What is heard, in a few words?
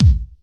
mid-range kickdrum sample c sharp key